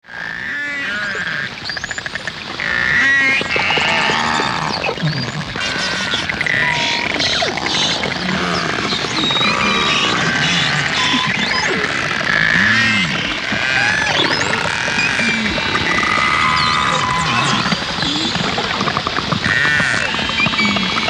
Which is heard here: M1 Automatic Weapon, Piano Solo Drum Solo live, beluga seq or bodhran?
beluga seq